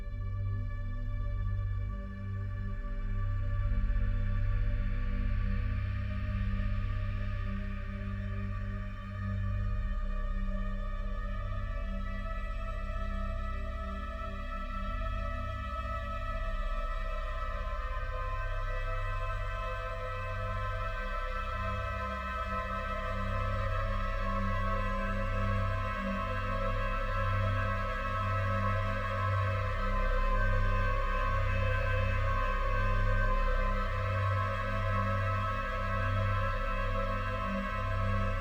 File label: ambient melody; ps3 windows xbox 360 gamerip 2007; ex ambient melody ukg; Soldier of Fortune: Payback